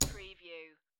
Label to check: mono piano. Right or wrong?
Wrong